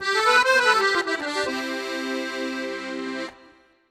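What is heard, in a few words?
Accordion 108bpm C end, African; Quazibeat - African Secret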